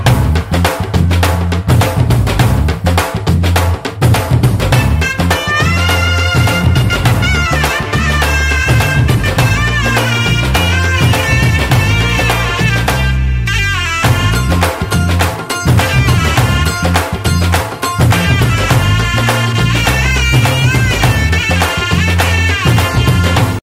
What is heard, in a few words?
Title Drum Beats Telugu Ringtone